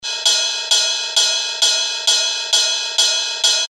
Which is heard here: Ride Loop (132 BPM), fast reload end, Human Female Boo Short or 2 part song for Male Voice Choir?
Ride Loop (132 BPM)